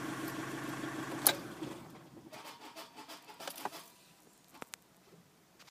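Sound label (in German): Nugget Geräusch; bj 2007; das geheimnisvolle geraeusch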